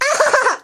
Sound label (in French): rire